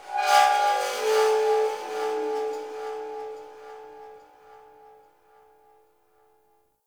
Metal Scrap Texture Med, Corpus Ship ambience; Textures

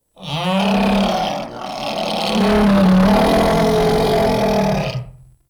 Ancient Tank Death, Infested; Warframe

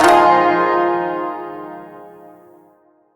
Shawzin Min Chord F; Warframe

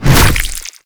Infested Ancient Tentacle Swing Hit; Warframe

